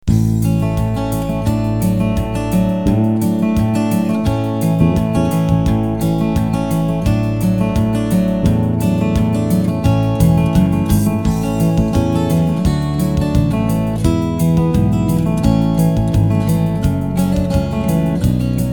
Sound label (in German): Gitarre Bass und Schlagzeug